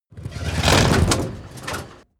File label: tank large damaged